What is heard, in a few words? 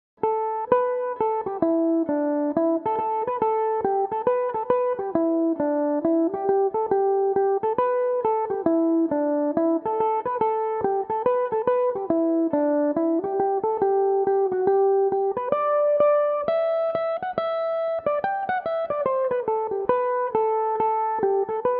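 G major, Irish mandolin; The Glenmore Hunt; Belmuse EM-190 electric mandolin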